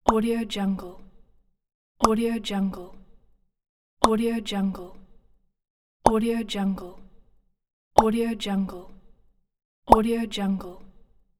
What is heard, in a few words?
bubble pop